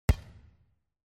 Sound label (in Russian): zvuk mjacha v volejbole